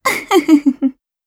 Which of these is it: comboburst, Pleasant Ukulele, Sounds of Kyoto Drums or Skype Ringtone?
comboburst